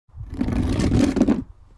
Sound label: scrape